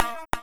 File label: flying tone; Cartoon - film effects